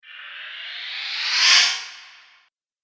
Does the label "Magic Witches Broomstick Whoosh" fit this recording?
Yes